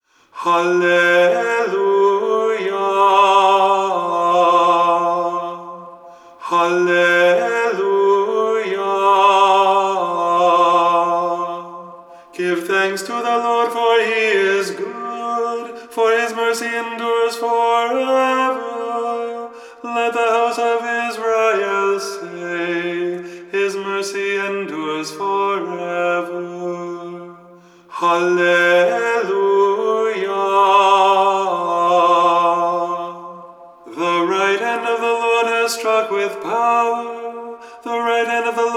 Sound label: triple alleluia